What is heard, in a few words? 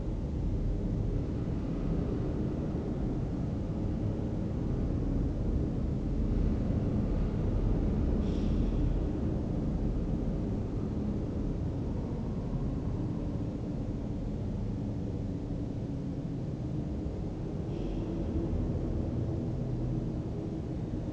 wind cont; WAR